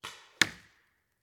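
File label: Paintball Gun Impact; Sound Ideas; Guns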